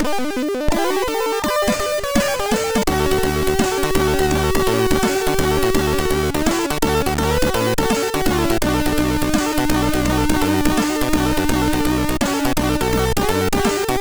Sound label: Bahia Funk, MOS6581R3